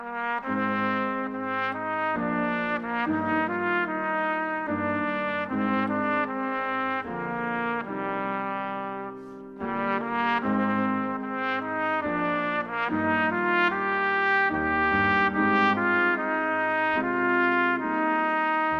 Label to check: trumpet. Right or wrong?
Right